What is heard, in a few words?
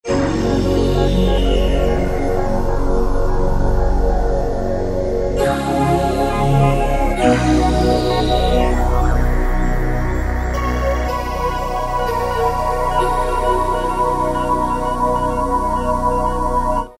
Combiner, E-mu Morpheus; Factory Presets